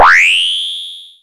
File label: SPACE DRUM